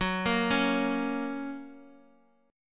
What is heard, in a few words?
F sharp Major; chordshape; down